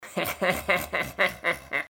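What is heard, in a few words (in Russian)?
звук смеха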